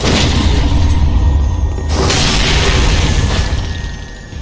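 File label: parry riposte; Dark Souls III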